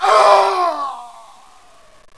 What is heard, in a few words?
player die